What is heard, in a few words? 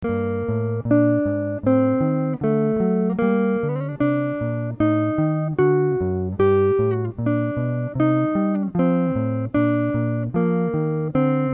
chord